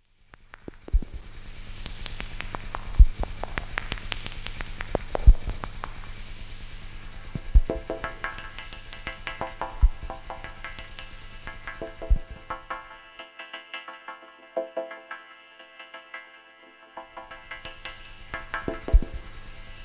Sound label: Korg Kaoss Replay Noise Vocoder